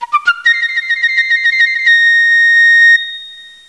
Whistle

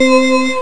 Rotary

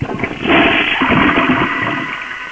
Empty Wastebasket

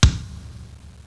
Drum

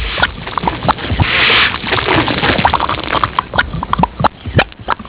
Blup Blup

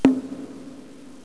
Drum

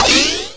Swhish

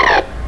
Zap

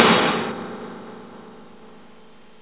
Drum

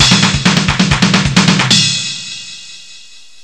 Drum

